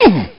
hit; BattleSNDS

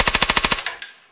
silencer burst

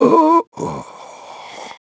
DYING; BattleSNDS

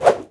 zombie swish

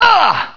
hit; BattleSNDS

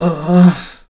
DYING; BattleSNDS

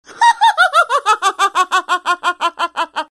LAUGH; BattleSNDS

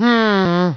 humm; BattleSNDS

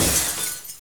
GLASS BREAK

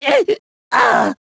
DYING; BattleSNDS

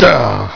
HIT; BattleSNDS

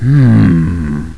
humm; BattleSNDS

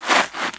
CROUCH TO STAND

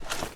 Draw LMG

